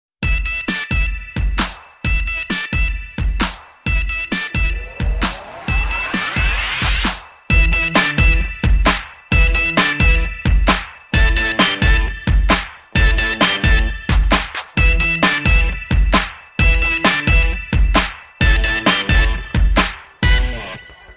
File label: Instrumental